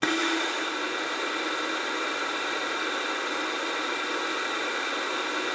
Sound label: luft, alto sax; Xsample VOL-2